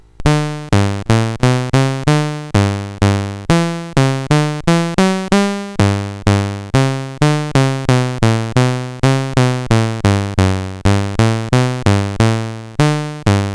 Minuet, CSG8580; CSG8580R5; Bach

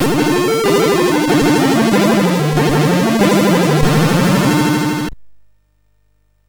sid MOS6581R3, GAMES; Abyss

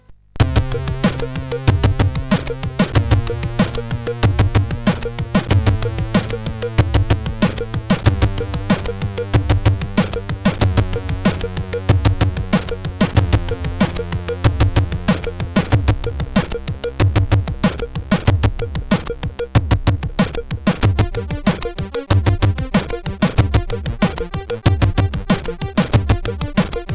Hyper Aggressive sid CSG8580R5

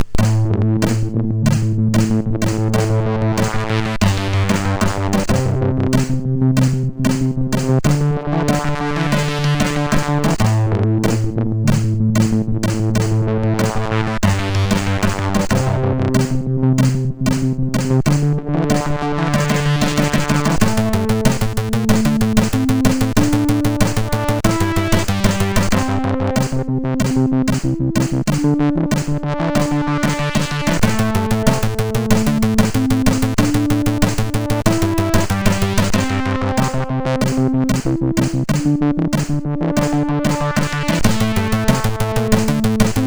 Dark Intro tune, sid MOS6581R4

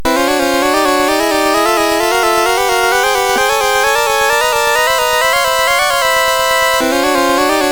Minor 9, sid MOS6581R4; hvsc

